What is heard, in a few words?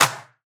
clap